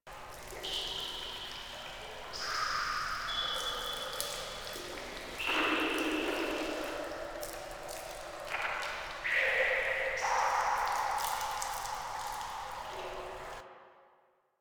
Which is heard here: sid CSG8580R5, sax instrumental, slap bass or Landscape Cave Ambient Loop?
Landscape Cave Ambient Loop